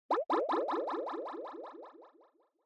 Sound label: Scene Fantasize Fast Short